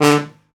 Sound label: tuba second line short